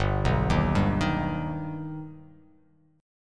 G Augmented G, chord; G+ arpeggiated; 3,2,1,0,3,x,x,x; JGuitar

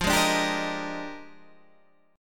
Bb 13th F

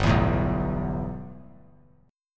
G 6th Add 9th; G6add9 strummed

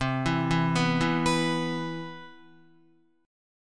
C Major 7th, Guitar; CM7 arpeggiated; JGuitar

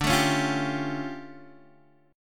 D Minor Major 11th, down, Guitar, Open D

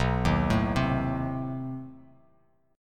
B 7th Flat 9th B down, Bass Standard; B7b9 arpeggiated; JGuitar

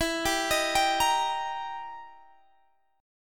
E Minor Major 7th Flat 5th E down Guitar Standard; EmM7b5; arpeggiated; JGuitar

